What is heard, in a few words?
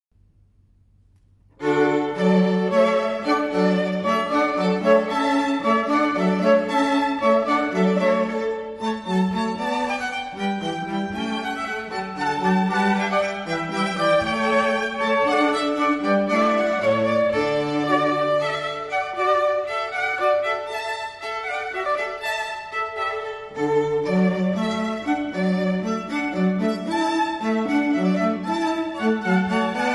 hornpipe, string quartet